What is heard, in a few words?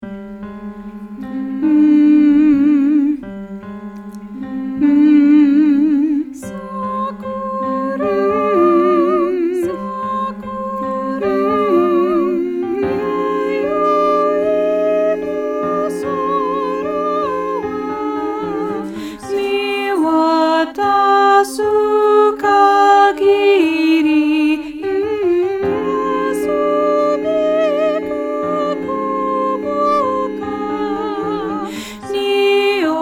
Sakura Sakura Alto